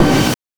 BANK2 SFX, NES DPCM; MIDINES

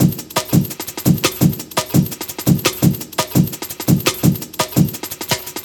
Break, Drum & Bass, Beats & Breaks; Fluid Dynamics

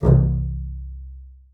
C1, bass spicc; Xsample VOL-5

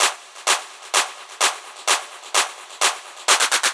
Drum Loop 128BPM Clap FX; VEC5; Drumloops; Multi Loops

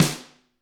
GRETSCH SNR, Double Platinum Drums; ILIO; Double Platinum Drums 1

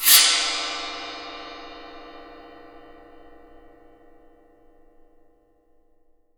wind gong; Voice Crystal - Steve Reid Definitive Percussion

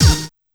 B5 "Uh!" + Hit, Yamaha PSR-E323 Sound Effect Kit; drum machine

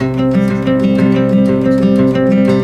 guitar, stringed instruments

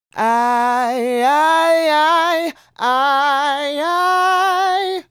Male Solo, Hip-Hop and RnB Vocals

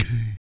VOC PERC, Vocal Planet Jazz FX BASS PERC

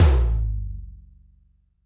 TD-20 Tom A, Roland TD-20 Toms

